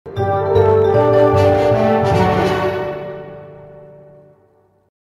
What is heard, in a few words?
battle start; Plants vs. Zombies Heroes